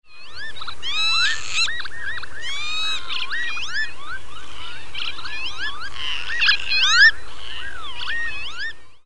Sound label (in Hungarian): bíbic, madárhang